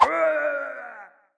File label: beam workers tap; easterEgg